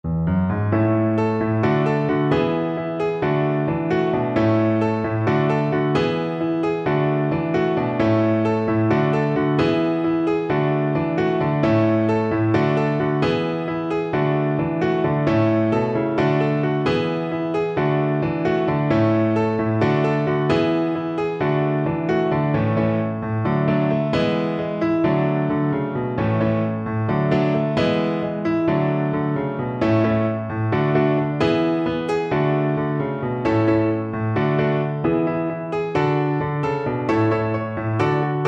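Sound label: viola karaoke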